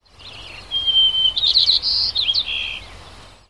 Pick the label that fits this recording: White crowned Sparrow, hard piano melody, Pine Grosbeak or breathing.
White crowned Sparrow